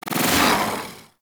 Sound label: Teleport Out; Warframe; Teralyst; Ropalolyst; Abilities